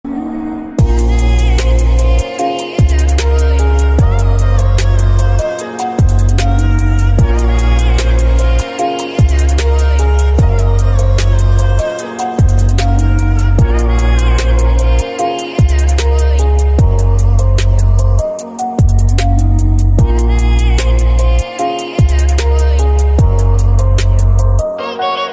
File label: ringtone sad